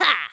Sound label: grunt, Boys Attack; spiritheroes; Grunts